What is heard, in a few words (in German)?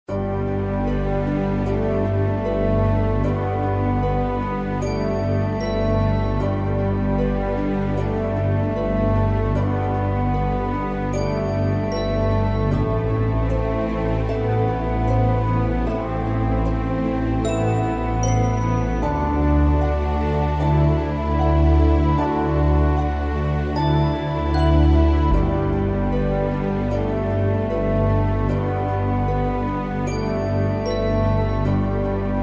Märchenhafte Entspannungsmusik